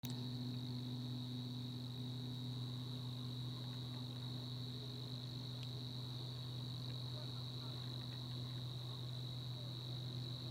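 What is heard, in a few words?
Locustella fluviatilis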